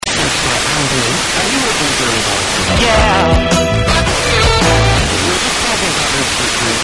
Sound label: ping